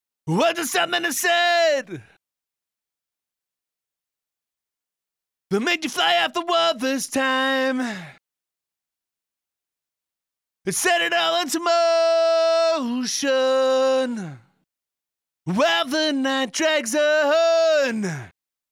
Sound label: Main Verse Low, Vocal Tracks